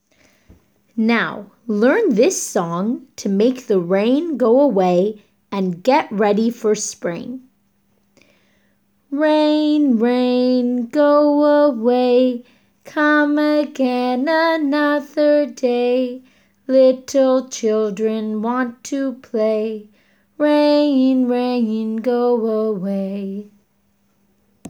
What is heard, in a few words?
Rain rain go away